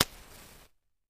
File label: Match Strike; lighters & matches